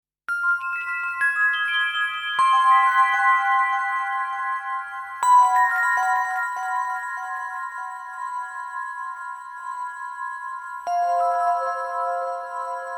Bell Threshold; Salisbury

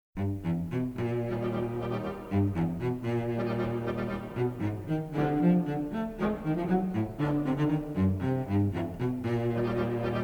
Concerto for Cello and Orchestra in E-Flat Major; Shostakovich; The Philadelphia Orchestra; Great Performances

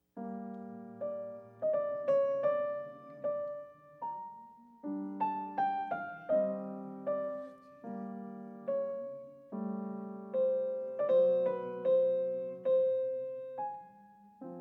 Goldberg Variations BWV 988 Variation; Bach

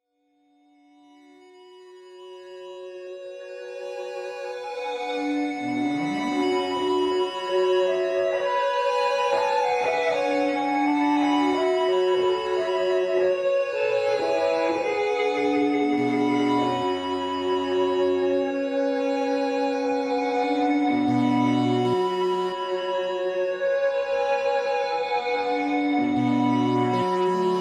Loop, Solo; national art center ottawa 13 august 1979

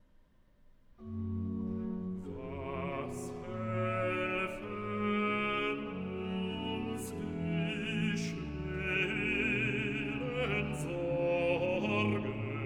Recitativo; Cantata No. 93, 'Wer nur den lieben Gott lässt walten' BWV93; Bach; Philippe Herreweghe; Cantatas

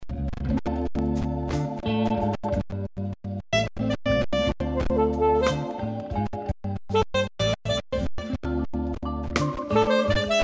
Instrumental

